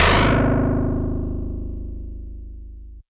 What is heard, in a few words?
Harpsi FM DX100 Gs0